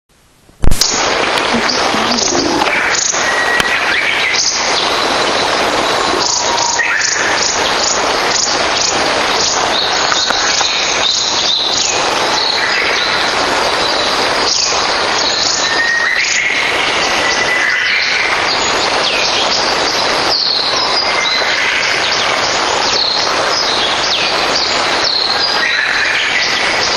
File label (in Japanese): ニューナイスズメ 戸隠